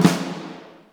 Concert SNR; Roland M-OC1

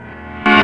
Piano, Underground Hip Hop; USB Soundscan vol.02; AKAI

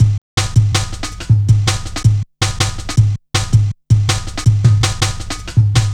Drum Loops, Jungle

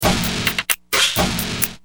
Sick kit, Drums Perc; Industrial

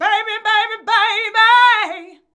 "babybabyba", shouts; Best Service; Hyper Dance

